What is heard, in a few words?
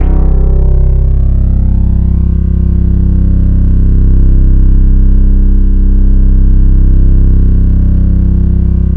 EDGELEAD; MORPHEUS